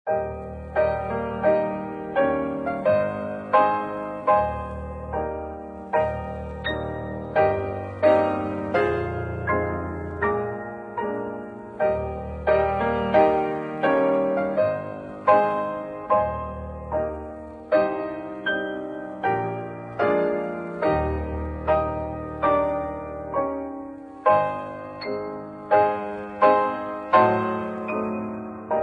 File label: footsteps